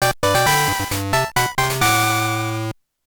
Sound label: Fanfare - Received an HM!